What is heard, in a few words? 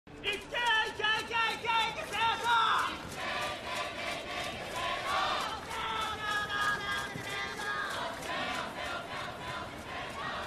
chants; Seijoh